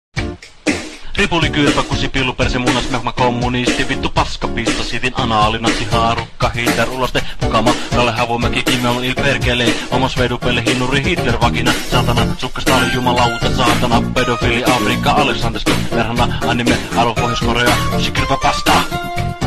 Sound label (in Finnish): suomi rap